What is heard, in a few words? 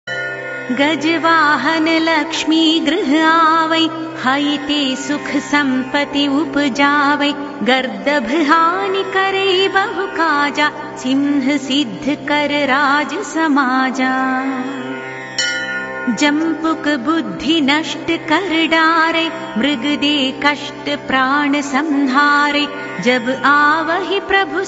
shani chalisa; deities